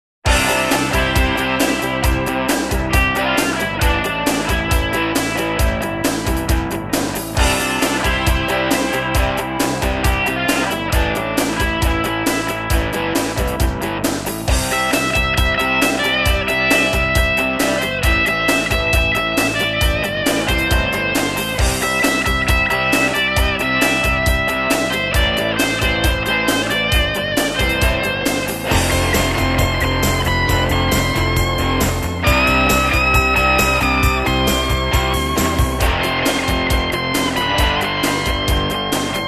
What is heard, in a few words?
pressure instrumental